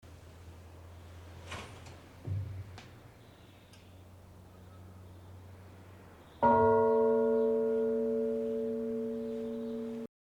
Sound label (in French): cloche; Sidiailles